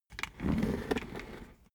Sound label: office chair worn trundle soft